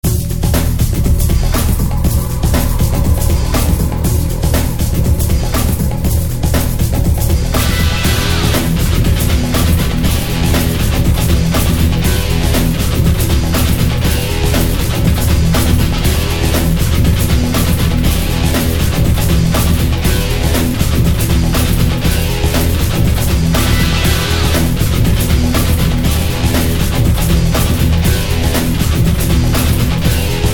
Approaching Medium; Serious Sam; Karnak

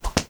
TOOL Whip Small; don t be afraid the first toy

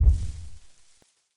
Distant Rumble

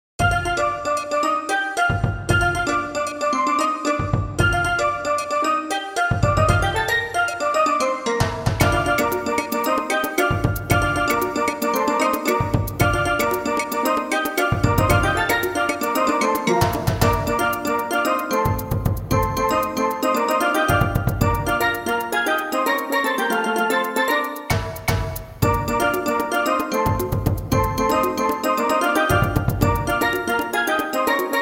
Caribbean; The Incredible Machine

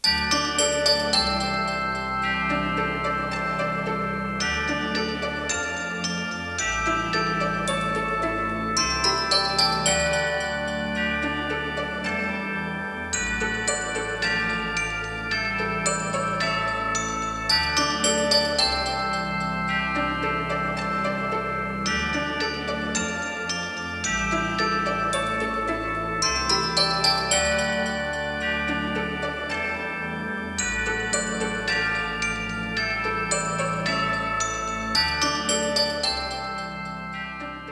Sleighbell Slopes; HarmoKnight; World 5

